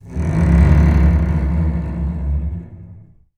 TALK BOW, BASS FINGER; Xsample VOL-5

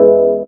DR. LOOP; X-Static Goldmine 3; CHORDS

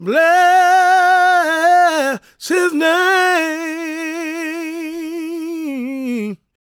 gospel male, vocal; ILIO; Vocal Planet

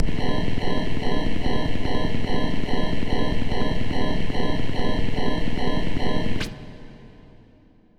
Styled Ambience

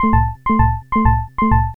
SYN FX; X-Static Goldmine 1

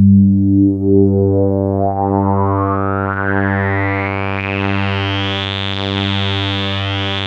Prophet 5 G2; Hollywood Edge - Giorgio Moroder Rare Synthesizer Collection

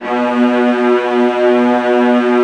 VIOLAS C#3, Synclavier Strings; ILIO

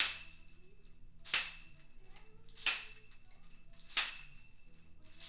Tambourine

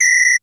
LONG WHISTL, D110 DRUMS; Crypton - DrumBase5000

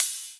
Rides, Cymbals

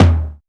TOM LO; Back In Time Records; Big Bang

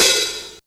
HAT, Hip Hop

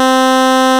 pulse sync, raw sync pads; Zero-G Ambient 1

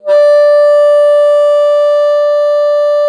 BASSOON D5; Xsample VOL-6

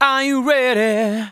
vocals sung F#; Techno Trance Essentials

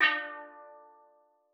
D3, STEELDRUMS; Xsample VOL-4

